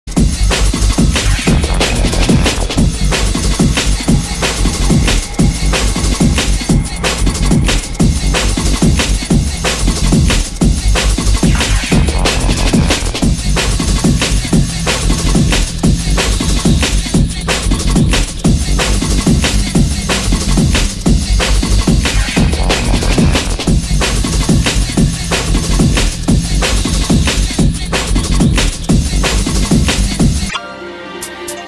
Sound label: Drum and Bass Jungle and Old Skool, UK Bass Radio